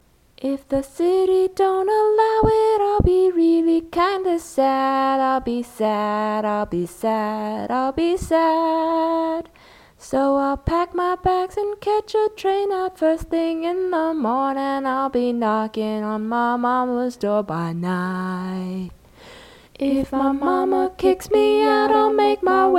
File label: chicken blues